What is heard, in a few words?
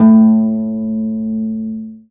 STR HARP, STR Orchestral; Sound & Vision; Gigapack I CD 2